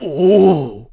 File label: Oohh; Charlo (Castle Town solicitor): "Oohh!"